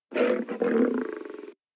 bear roar; hungry bear media

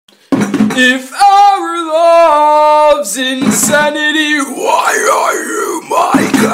black kid sings

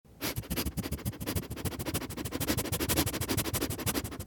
sniffing

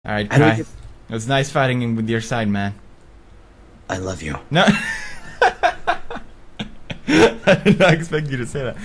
cry; pewdiepie; i love you

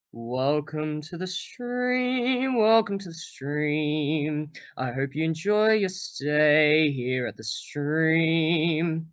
gamer sings

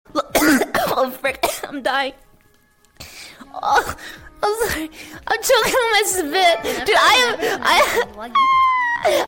choking on spit

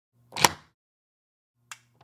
locked doors

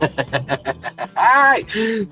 hahahahahaaaaai

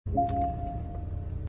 chime; cayenne 958

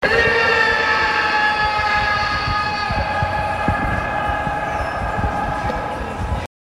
scream

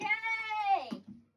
yay

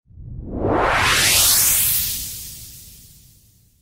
transition sfx; balita

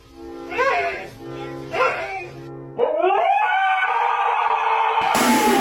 dog scream

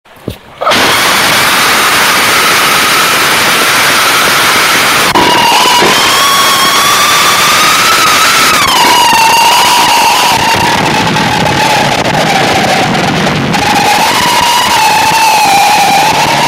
freaky scream

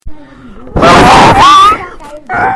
random scream

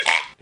eaah; jontron

